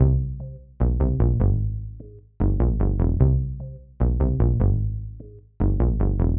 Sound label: Pad Bass